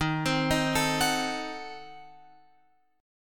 D sharp Diminished 7th D sharp Guitar Open F; arpeggiated; D#dim7; x,10,12,10,12,13; JGuitar

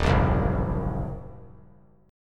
Eb Minor 6th chord; strummed

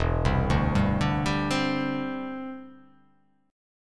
E Minor 7th Sharp 5th E; arpeggiated

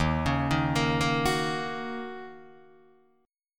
D sharp Minor 7th, Guitar, DADGAD

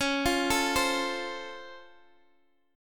Db Augmented Major 7th Db down Guitar Standard; arpeggiated

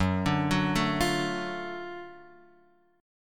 F sharp Minor Major 7th Sharp 5th F sharp down

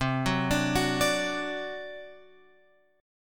C Suspended 2nd Flat 5th C down Guitar Open F; Csus2b5 arpeggiated

